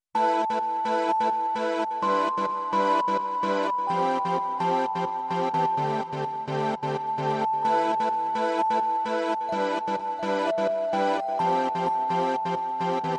No Vocal SFX Mix

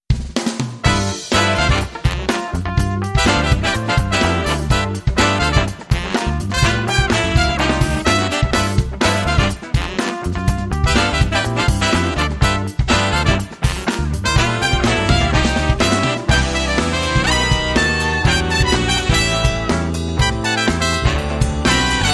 Funk Brass

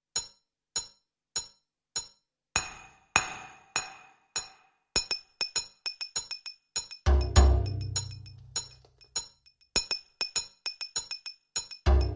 Hits Only

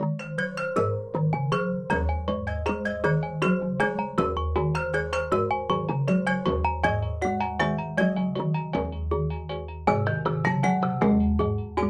Marimba Mazurka

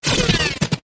Thick Squidger

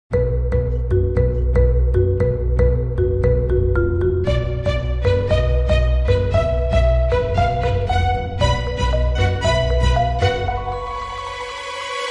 Dramedy Sting Six, No Perc

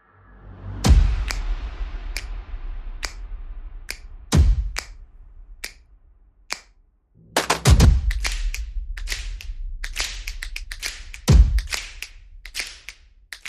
No Horns

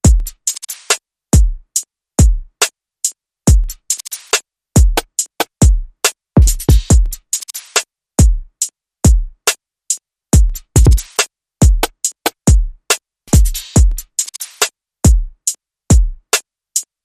Trailer Beat

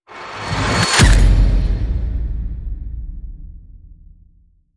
Anvil Hit

